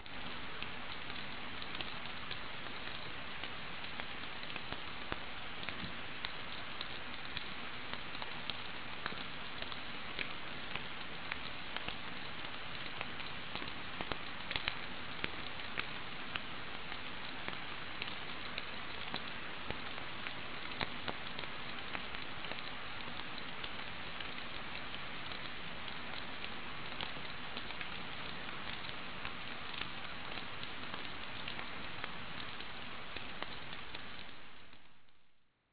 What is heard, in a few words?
drippi; Sensorium; kawasaki